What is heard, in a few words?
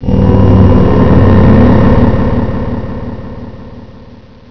MONSTER ECHO; Chris Marker - Immemory